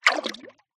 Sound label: multimedia game designed water movement